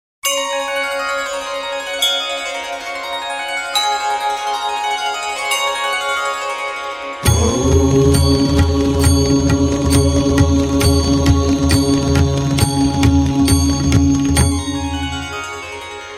ommmmmmmmmm

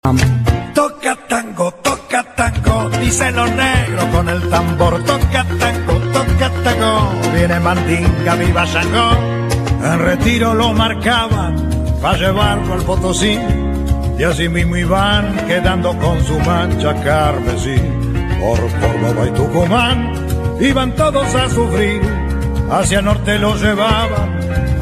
tango

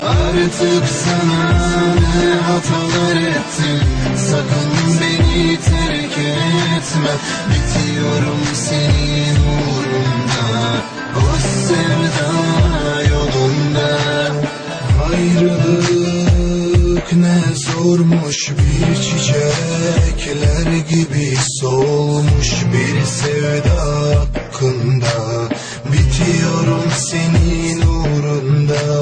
Tribal

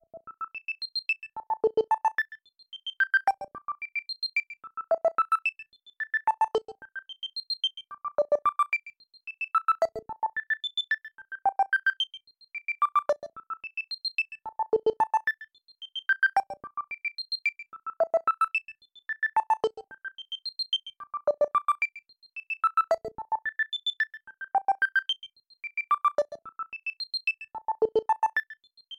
Arp Bell Ringtone

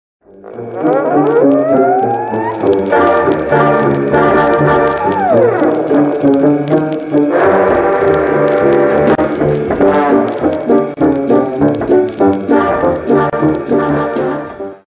Radio music, ringtone

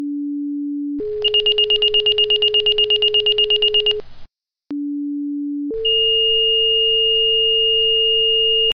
SIREN

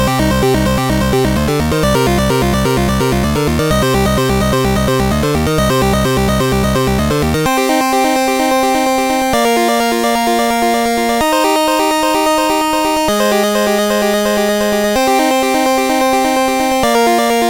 Groovy 8bit Intro

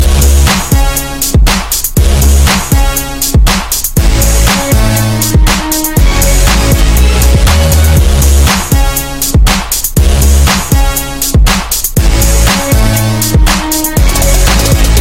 cool beats

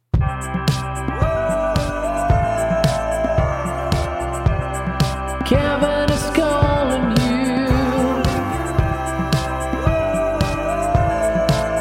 Is Calling Pop

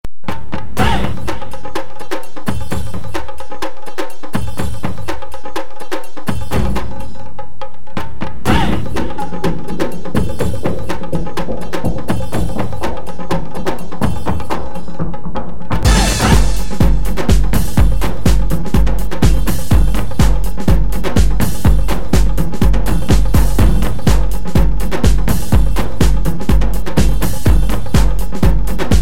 arabian nite, hip hop ringtone

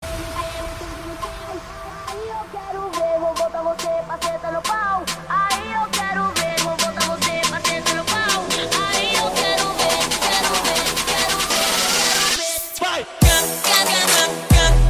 Trap

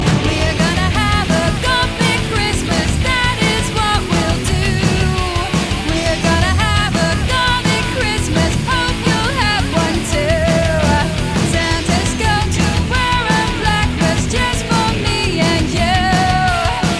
gothic christmas

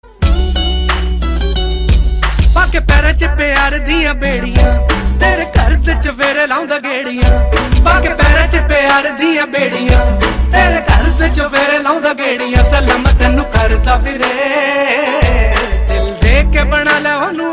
love guitar

